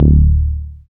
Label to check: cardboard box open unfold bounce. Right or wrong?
Wrong